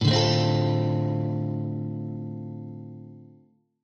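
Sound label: D min7, Guitar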